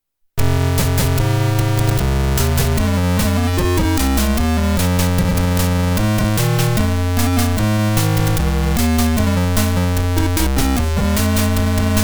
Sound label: ym2149, electro; aym